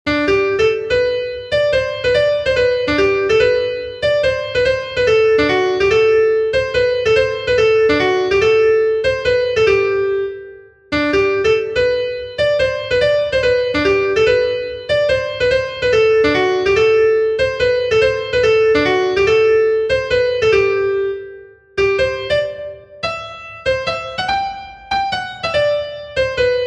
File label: St. Cecelias Waltz, fiddlers; southern kings fiddlers